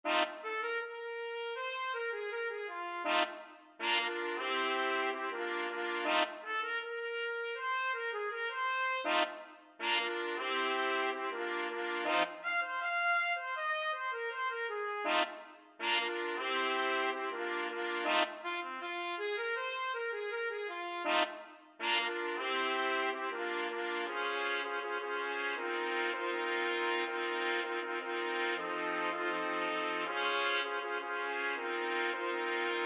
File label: trumpet quartet